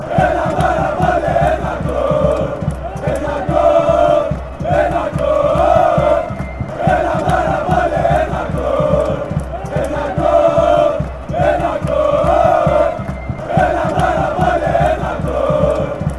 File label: greece chant